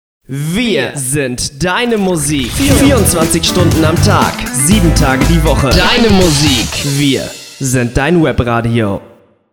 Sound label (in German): Jingle deine Musik